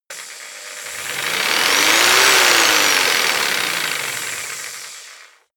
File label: jigsaw engine medium fast medium length; maschinen; saege